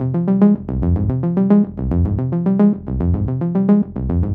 110 Fm Forlorn, Melodic Loops; Electronisounds

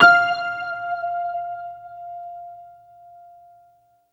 Piano 1 (F; Sonic Foundry (Sony Creative Software); Ugly Remnants VOL-2

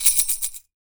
YAQUI; AKAI S6000 CD-ROM - WorldSounds

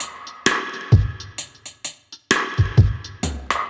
Dub Drum Kit C Wet 130bpm; musicradar; Dub Drums

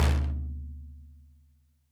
BASS, HAND DRUMS; SoundBytes - Early Patches

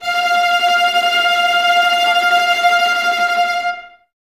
Violas Tremolo, String Ensembles, F; Miroslav Vitous

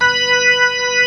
HAM B5 L, keybord

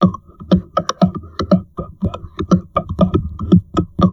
TALK, Drum Loops Crazy Processed

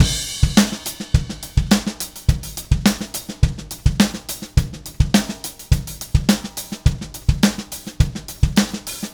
Beat Crash; Session 02 - Clinical

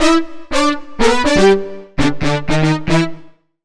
Brass, Keyboards

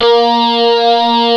ROCK, Classic Guitars; E-MU Formula 4000 Series Vol. 3 – Analog Odyssey

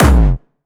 Distortion Kicks, Bassdrums

